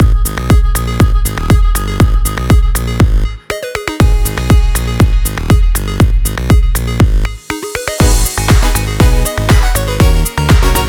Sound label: Conga Remix